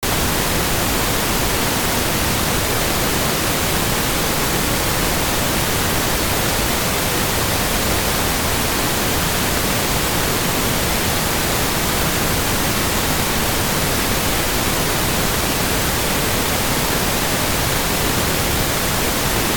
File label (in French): bruit rose